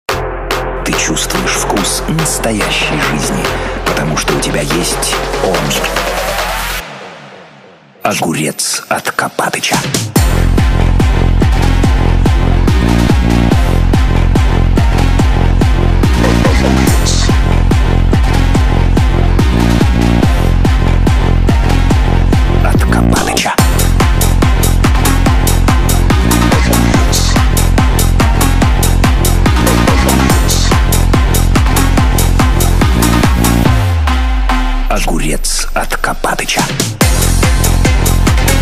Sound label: phonk; smeshariki